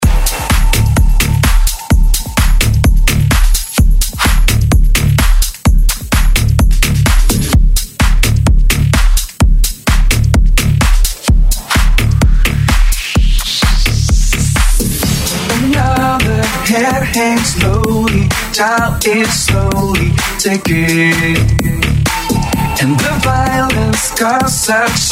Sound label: Electronic Music